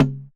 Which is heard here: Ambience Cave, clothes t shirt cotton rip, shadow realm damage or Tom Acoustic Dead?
Tom Acoustic Dead